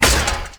Turret UI Menu Close; Warframe; Missions; Zariman; Zariman Turrets

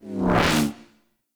player fx disable; Duviri

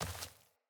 Sandals Walk Dirt; Player; Footsteps

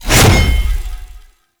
Sword Swipe; Warframe; Ballas Sword; Weapons Melee; Whooshes

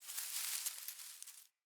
Forest Open Glade Grass Move Close; Day

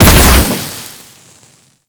Auto Crossbow Charged Shot; Warframe; Weapons; Tenno

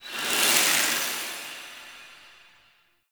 Infested Hub Temple Door Open; Ambience; Props; Warframe; Infested Eris

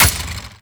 Arch Dual Pistol Weapon Hit Mech; Warframe; Archwing

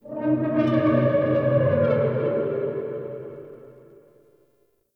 Unstable Texture, Ambience; Warframe; Shadowgrapher

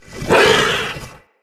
Corrupted Battle Dog Attack Bite; Animals; Vocals

